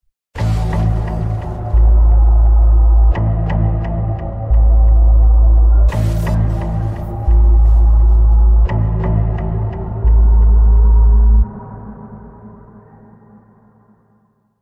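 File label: sounds with effect of completion, ending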